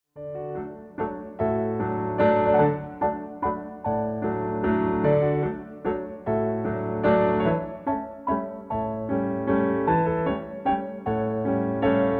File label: Pirouettes medium, music for ballet class